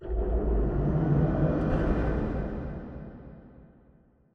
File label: Robot Arm Tilt Down Dist; Boomtown; Titanfall 2